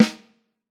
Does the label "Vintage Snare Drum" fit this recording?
Yes